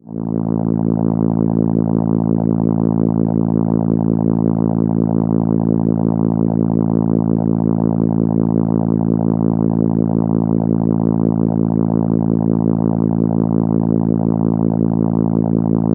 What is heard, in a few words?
Contra A3, ARP Solina